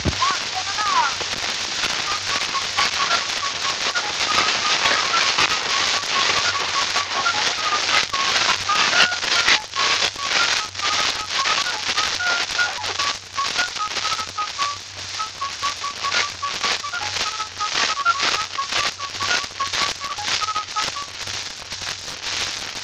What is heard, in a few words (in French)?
Clairon Marche